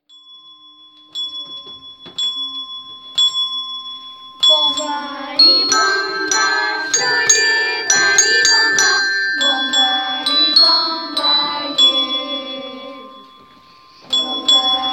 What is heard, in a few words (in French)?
bambali voix